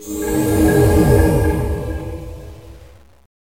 BELL

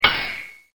Spring

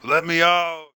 INSANE

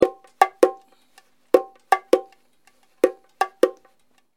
PERCU

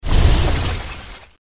impale launch

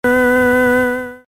Electric Sound

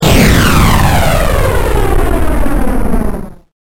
Firework

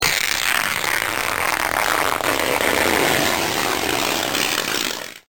Ripping

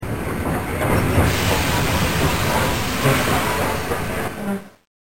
HISS

